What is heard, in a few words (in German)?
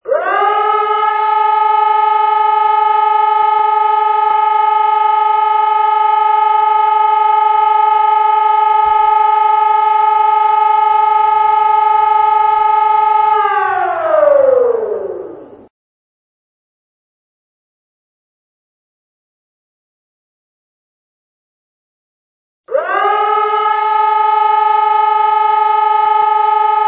feuerwehreinsatz